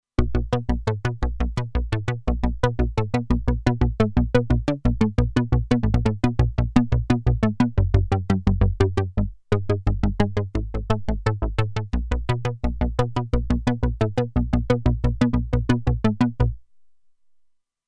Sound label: Seq Pluck, Roland Alpha Juno-2; Factory 2